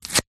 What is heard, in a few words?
office business card rip tear